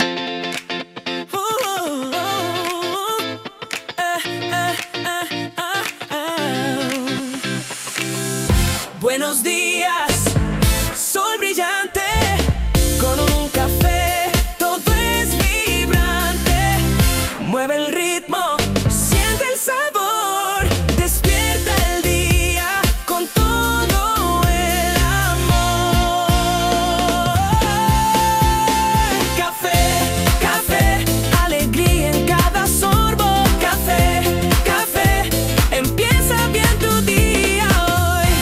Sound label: Jingle